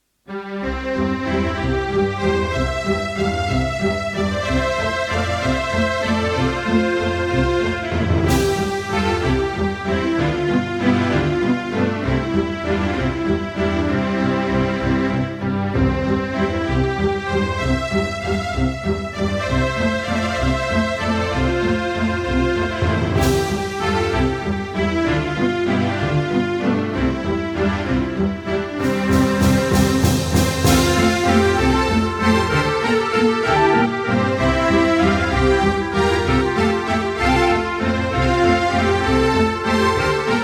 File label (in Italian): Valzer in Fa Maggiore